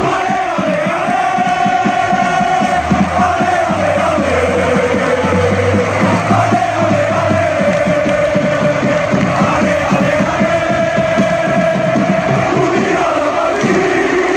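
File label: catalan, football chants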